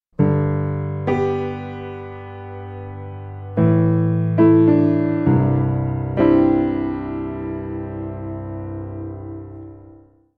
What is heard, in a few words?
Dull Piano